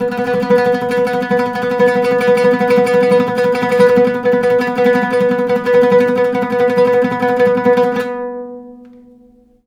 LUTH B3, Arabian Traditions; USB Soundscan vol.43; AKAI

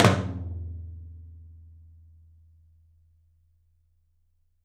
open tom; 3D Sound; 3D Drums; 13 TOM

